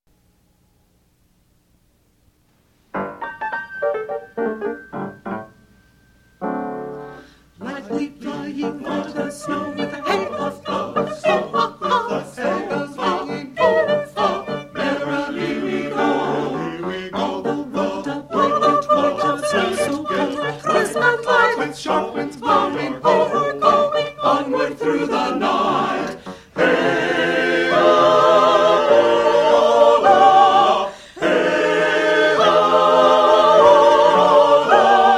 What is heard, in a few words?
The Sleigh Soprano, Choir